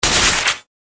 short lazer

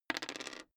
dice roll table

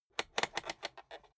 rotary control short turn

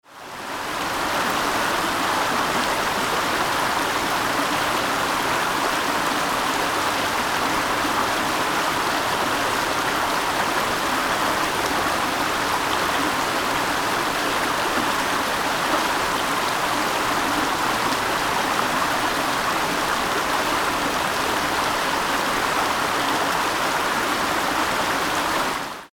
river rushing fast current

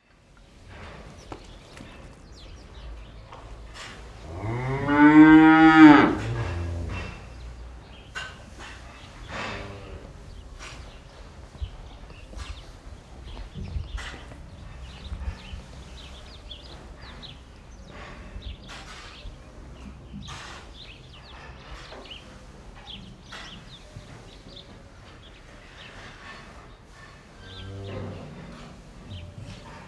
cow barn

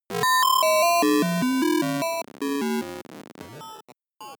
broken computer collapse

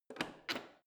plastic button creak press

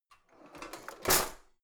old drawer creaky out hard

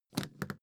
suitcase lift up

